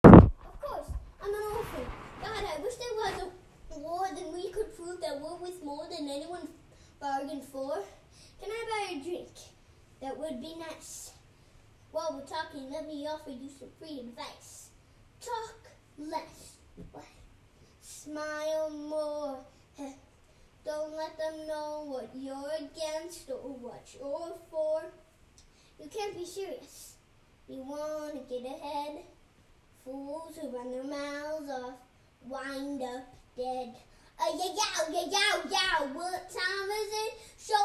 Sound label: Singing; Hamilton